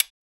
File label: office, sound-effects